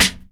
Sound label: SD, Dance Drums